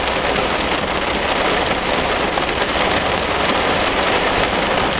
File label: rain